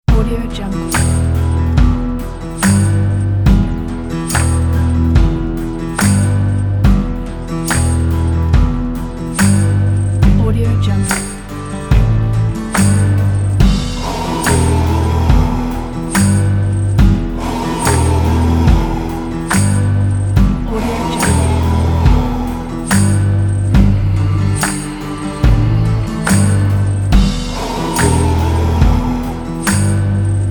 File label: dark country; magicmood9